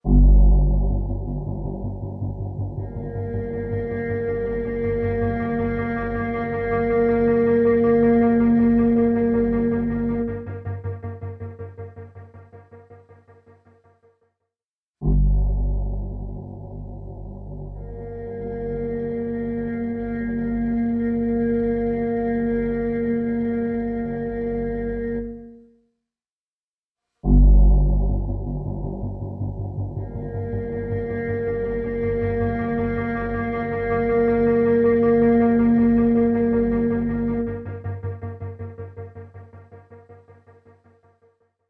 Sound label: H90 Programs Bass Synth; NovaWaves